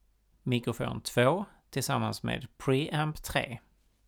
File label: mic pre